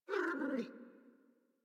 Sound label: Puppy Idle Coo; Warframe; Kubrow